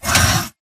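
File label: Stalker Movement Servo Heavy Short; Titanfall 2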